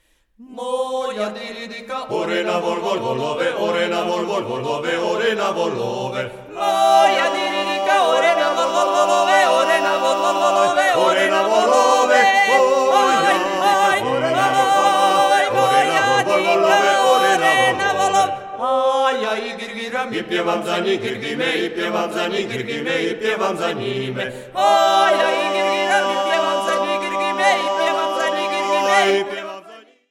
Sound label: acappella; Moja Diridika